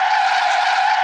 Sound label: skid hard